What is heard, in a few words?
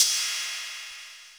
Ride; Equipped Music; Loungin House